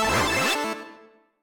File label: spawn